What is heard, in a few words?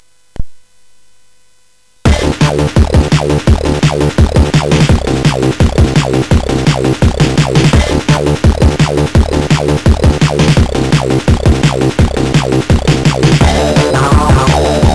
Classic, CSG8580; CSG8580R5